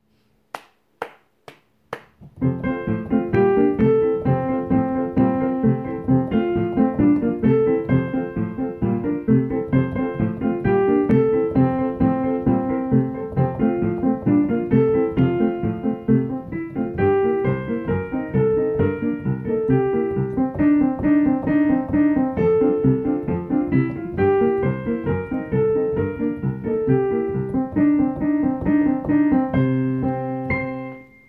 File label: alto accompaniment